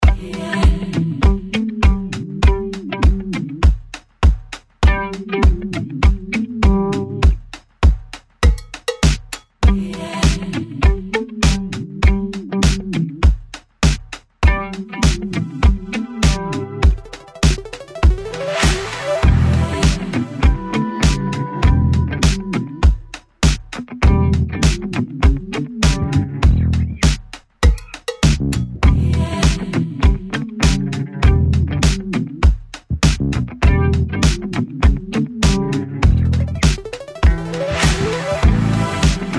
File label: rhythm blues; Background Music